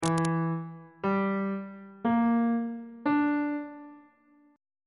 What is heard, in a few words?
d double sharp half diminished 7th chord intervals on bass clef